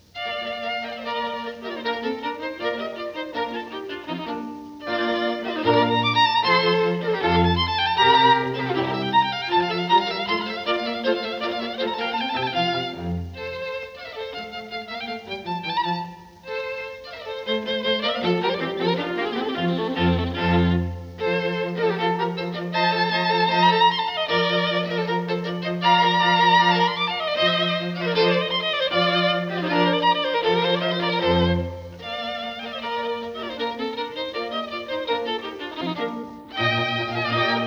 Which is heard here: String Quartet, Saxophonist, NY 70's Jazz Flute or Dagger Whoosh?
String Quartet